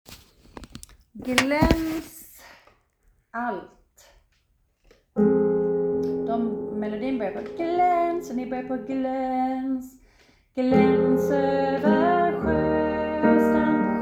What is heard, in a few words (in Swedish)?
folklig alt, seniorkor; korsang